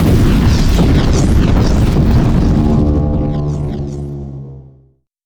shake, Earthquake and Open sesame. (open big door.); 44100Hz, 16bit, stereo.